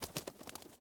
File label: flap wings short; Rust